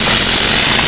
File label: jet gun, weapons